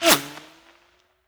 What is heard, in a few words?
bullet by subsonic; weapons; nearmiss; Counter Strike Model Pack; CS2